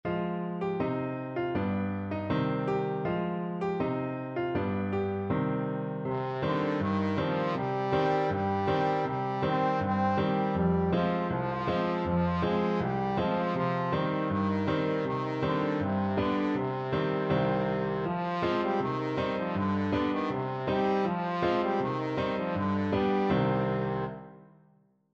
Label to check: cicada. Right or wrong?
Wrong